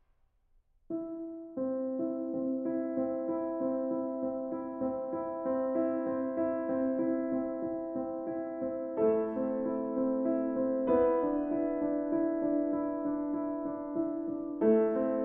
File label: epic piano solo; interstellar